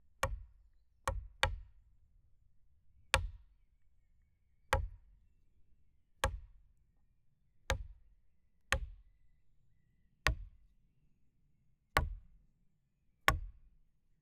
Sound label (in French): Claves HF serie x18; Percu Bois